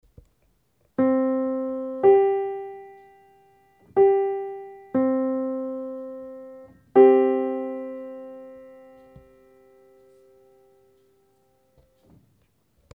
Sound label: 697 Bachs Seal 697.2